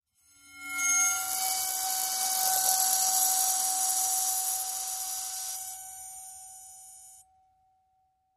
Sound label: Vibrate Bowl, bowed metal